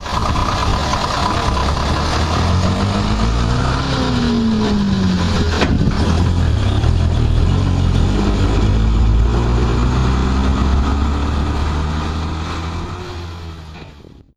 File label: leyland comet; Simutrans; Pak128.Britain-Ex